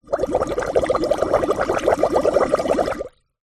household water bubbles through straw into metal pan